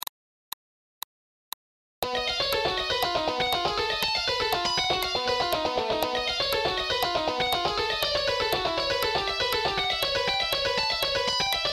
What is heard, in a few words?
Exercises For Fast Picking; Rock Licks